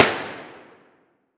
Weapon Gun Pistol 38 357 Revolver Single Shot Interior Shooting Range; AdobeCC